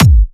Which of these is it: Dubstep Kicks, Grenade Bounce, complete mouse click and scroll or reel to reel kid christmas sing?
Dubstep Kicks